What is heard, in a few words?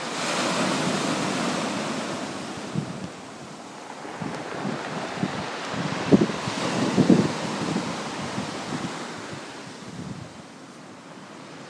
sounds of andalucia sea waves